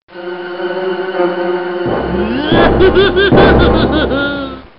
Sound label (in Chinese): 狞笑; renlei